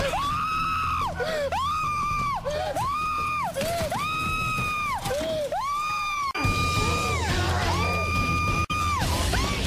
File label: screams